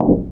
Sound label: glass, footsteps infected run